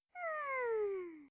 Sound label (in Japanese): nnn, breath